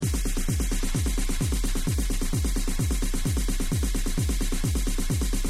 drumloop

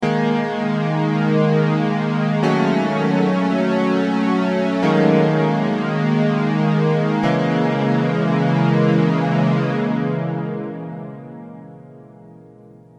m1000 dimension c

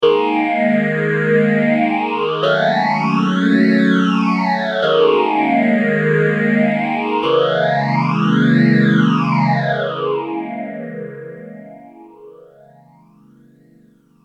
m1000 boss ph3

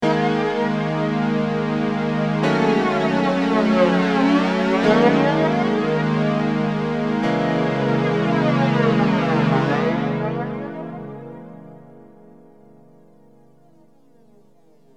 m1000 sbf325

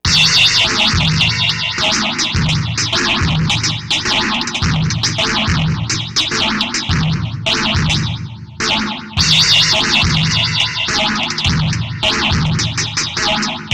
distorto beat tcxii re

